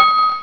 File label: DING; ACENSEUR